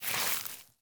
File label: grass step, footsteps; NS Studios - ultra sound collection